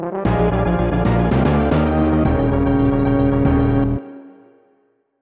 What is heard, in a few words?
Lose Life; Donkey Kong Country 2- Diddy's Kong Quest